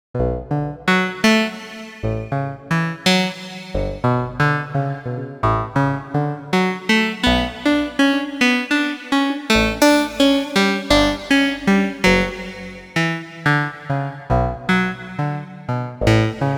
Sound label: key pluck short; the digital life